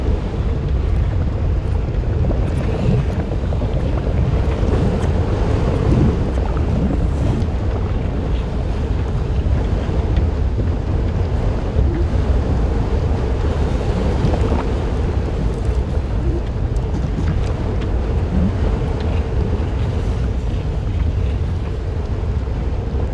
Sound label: sizzling lava; Fate The Cursed King